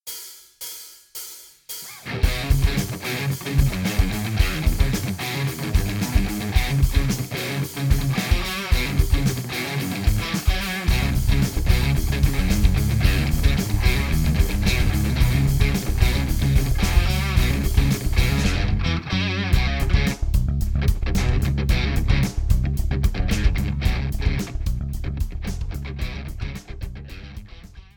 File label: cynical